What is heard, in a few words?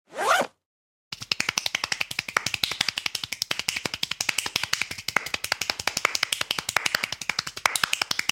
zip, zip sound effect